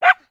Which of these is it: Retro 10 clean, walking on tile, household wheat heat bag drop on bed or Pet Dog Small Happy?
Pet Dog Small Happy